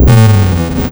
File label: BASS SYNT; BASS SYNTHES; AKAI; Big Beat Samples & More